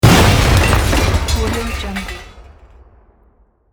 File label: cartoon animation explosion